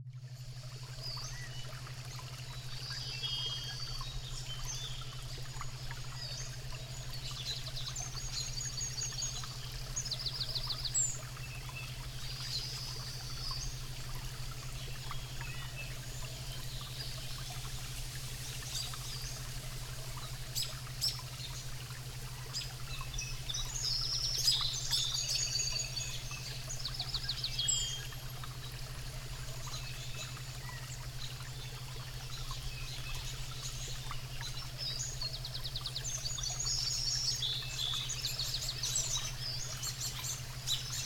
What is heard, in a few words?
Forest Relaxation Sound Bath with Alpha; Sound Baths